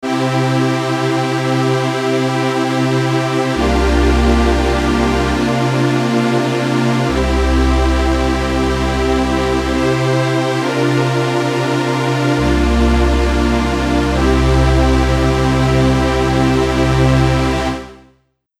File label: saw pad mono dry